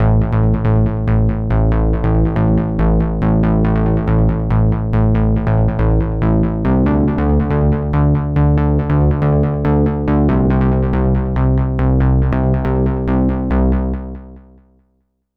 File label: HARD BASS 140 A, Hard Trance, Bass